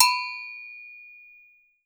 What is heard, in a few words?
AGOGO LO, Brazil Drum Perc; E-MU Producer Series Vol. 7 – Old World Instruments